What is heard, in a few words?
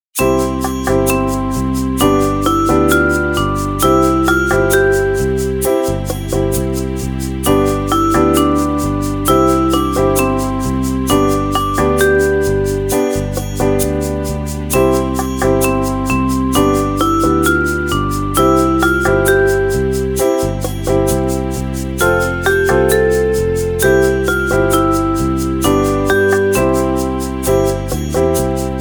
Full Ensemble